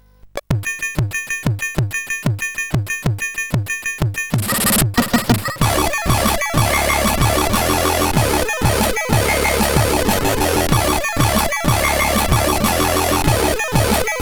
Compotune, sid CSG8580R5; Assembly 92

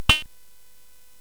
SFX; Narco Police; hvsc

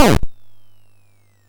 Frantic, MOS6581R4

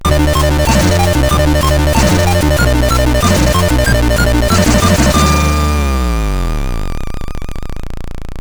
Jingles, sid CSG8580R5; Freeway 86

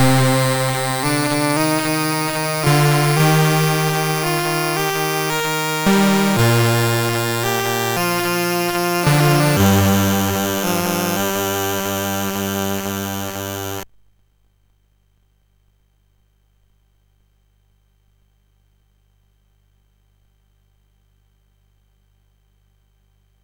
Invader Alert, MOS6581R3

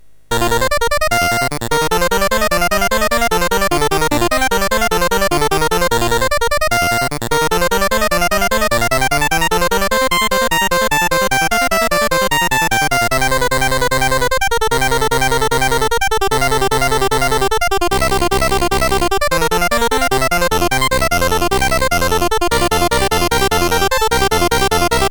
Sonata in B-Flat Major MOS6581R3

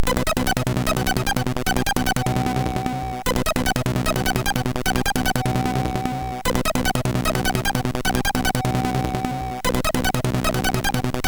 sid, MOS6581R4; 40 Countrytekh

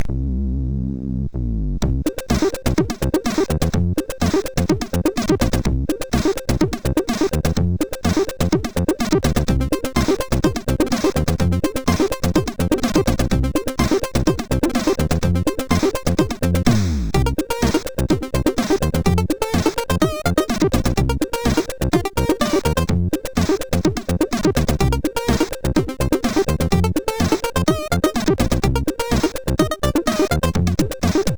Big Funk plus FX, MOS6581R4

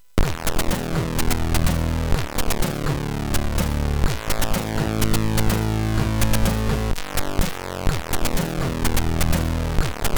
Drone, MOS6581R4

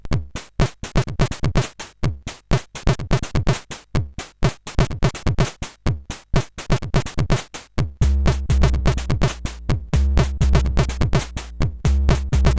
Chill, sid CSG8580R5

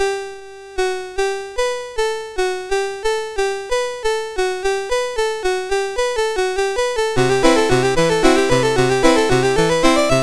Waltz, MOS6581R2; Minute Waltz Op64 No1; Roger Ambill

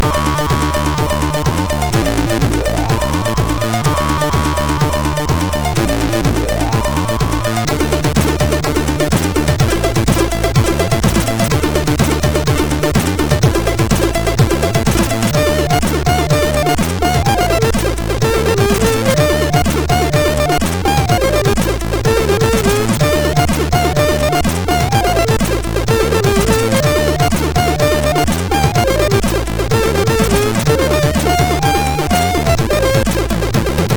Intro, MOS6581R3; hvsc

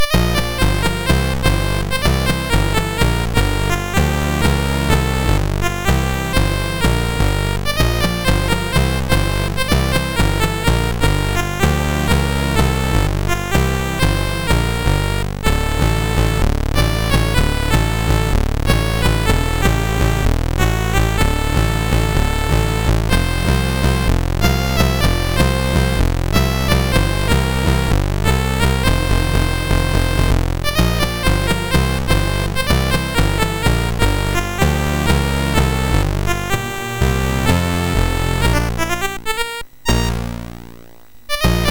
Conga, MOS6581R3

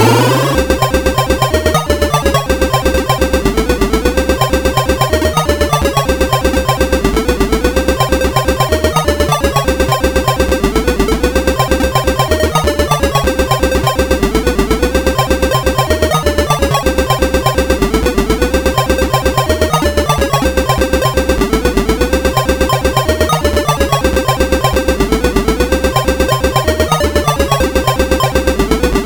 Solomons Key, CSG8580R5

